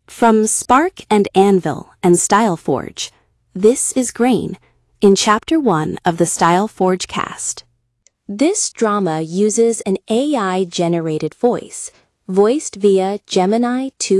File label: grain